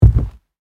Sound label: Footsteps on wooden floor boots single step